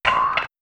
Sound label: action knob